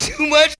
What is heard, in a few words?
VOX; ZERO-G; Datafile